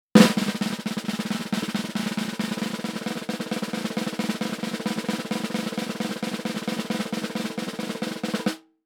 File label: Cartoon Drum Roll Snare; AdobeCC